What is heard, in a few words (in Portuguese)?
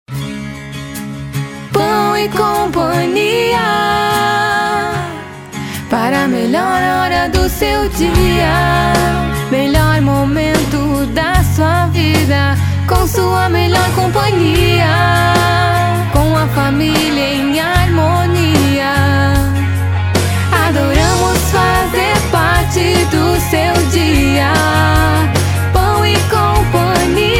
Feminino, jingles comerciais; Pao e Cia